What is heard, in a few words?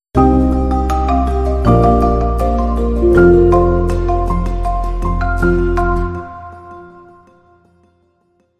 Sting